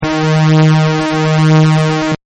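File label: AMB LOOP, Sci Fi Atmospheres; Partners In Rhyme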